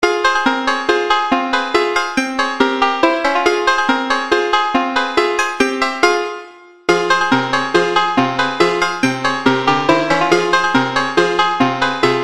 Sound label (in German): sample sax